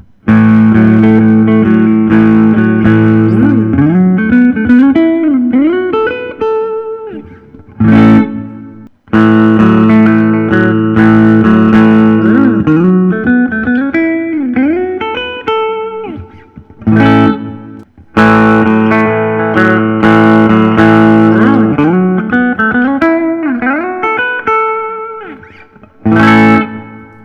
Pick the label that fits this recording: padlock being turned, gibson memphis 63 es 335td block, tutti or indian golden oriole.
gibson memphis 63 es 335td block